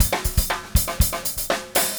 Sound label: Green Maple Groove 120 BPM, Vital Drums, DW Green Maple Kit; Sonic Foundry (Sony Creative Software)